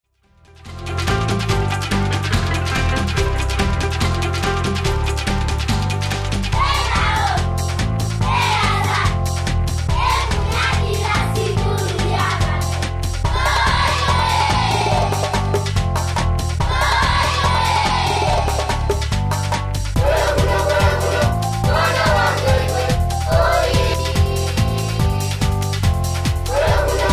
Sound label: African Beach